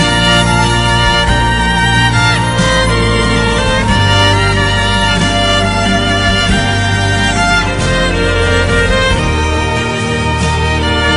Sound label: Violin Cover